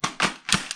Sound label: LMG insert mag